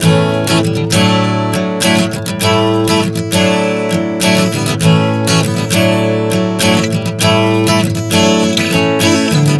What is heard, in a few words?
Progression 100 A-D-E-D, Acoustic Guitar, Chord Progressions 100 bpm